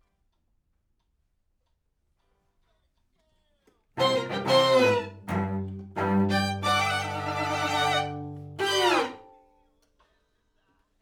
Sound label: Main Strings, RNR for piano